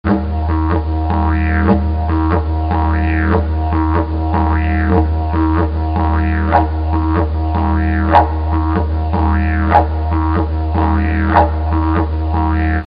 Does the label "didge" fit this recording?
Yes